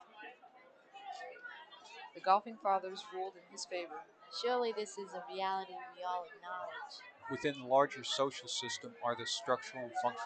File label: output spp babble